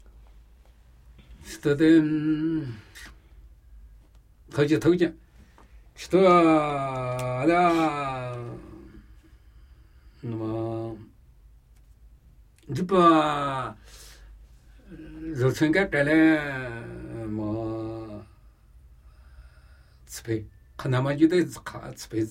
Namuyi Tibetan Ritual Chanting; Ru lu tche su

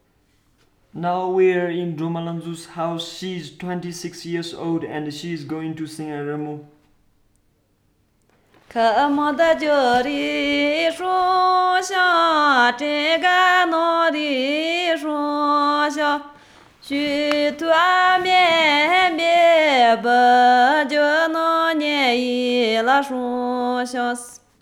Nyi shar Folk Song

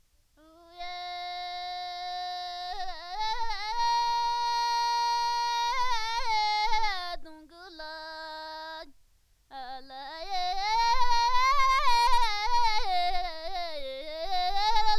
Klu mo skyid Folk Song

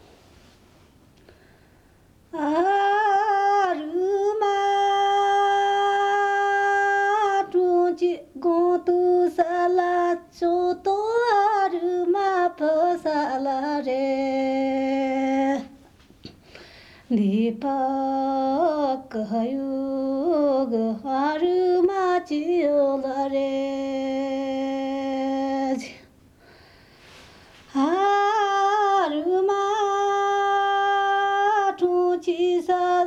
Folk Song

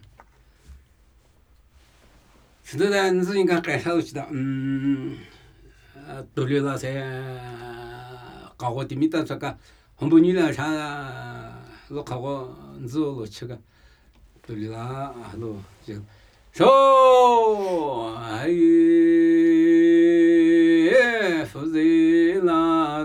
Namuyi Tibetan Ritual Chanting; Ru lu tche su

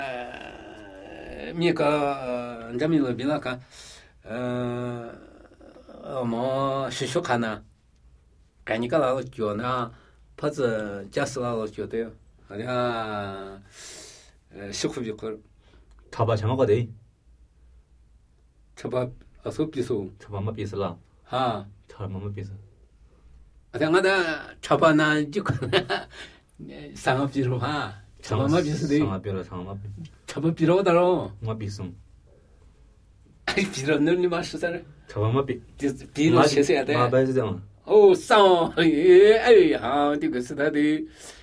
Namuyi Tibetan Ritual Chanting; Pe ka phse su

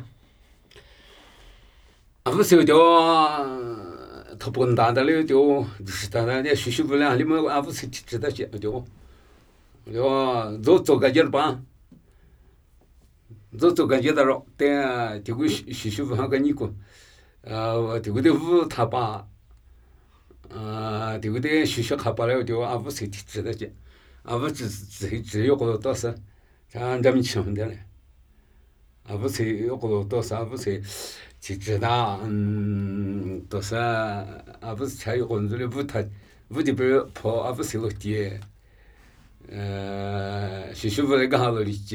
Namuyi Tibetan Ritual Chanting

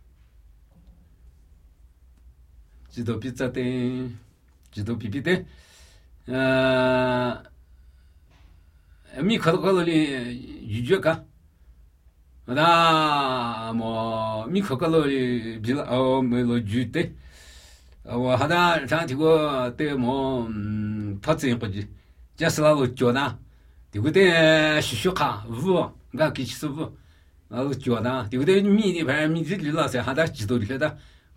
Namuyi Tibetan Ritual Chanting

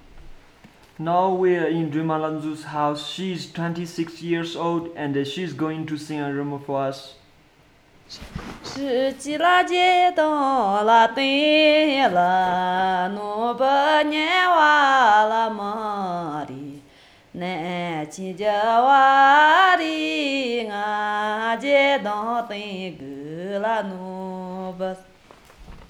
Nyi shar Folk Song

